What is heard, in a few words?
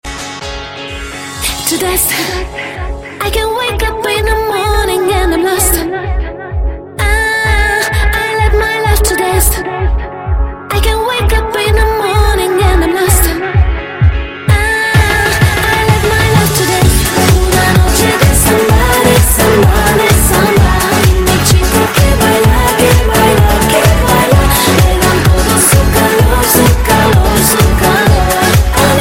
samba